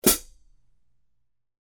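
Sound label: HH ZHT 14 HPR 14, hi-hat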